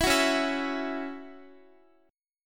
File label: Db Minor Double Flat 5th Db Guitar; strummed; Dbmbb5; JGuitar